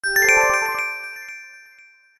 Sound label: newrecord; merge push